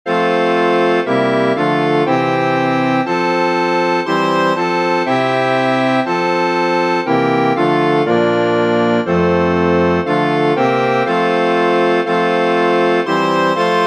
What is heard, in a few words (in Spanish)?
oh pan del cielo dulce bien, Himnos Cristianos